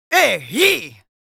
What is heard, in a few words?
Yells, Viking Vocal